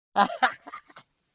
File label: Humorous girl, Laugh